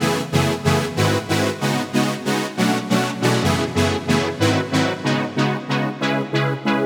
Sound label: Lead Phat; hera